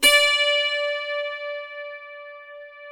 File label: D5, DULCIMER; SoundBytes; Early Patches